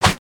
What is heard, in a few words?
Shuriken throw